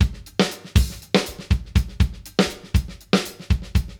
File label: Beat Loops; Zero-G; Monster Beats